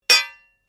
Blade Heavy Moving Impact Ring, Sword; Foley sounds for melee weapons; Swords; Combat; SoundEffects-Foundation1

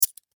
Emergency Handcuffs Key Turn Latch Open Clicks; Objects

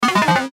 Chime Multimedia; Web Interactive; Chimes; Technology; Blastwave

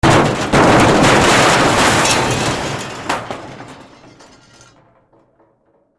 Crash Metal Smash Debris Large Pieces; Crash Metal Smash Debris Large Pieces Multiple Crashes; Blastwave

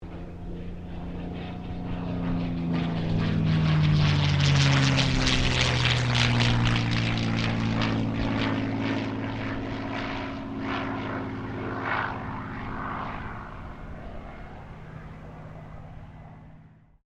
AIRCRAFT CA-18 MUSTANG PASS

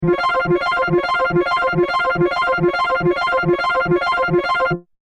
Computer Alert, Science Fiction; Computers; Crafted Signals

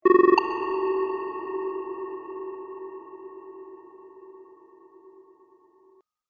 Game Cavern Tokens; Video Game Sound Effects

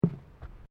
Footsteps Boots Bridge Wooden Step; Footstep Foley; Human

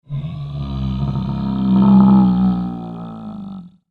Triceratops Dinosaur Roar; Dinosaur Sound Effects; fantasy sound effect; Andrew Fleming

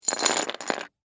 Game Pieces, Drop, On, Board; Sports; Plastic; Monopoly, Hotels; Board Games; BlastWave

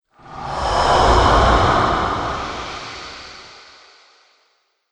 Pyramid Phantom, ghost sound effects

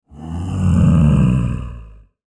Monster Growl Slow Snarl; Beast Creature; Horror; Animal

